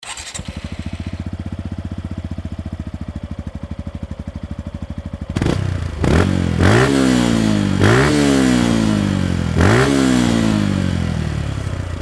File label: gixxer; gixxer bms r